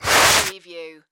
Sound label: Snowboard Slide Stop